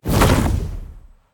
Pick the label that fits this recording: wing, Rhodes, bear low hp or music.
wing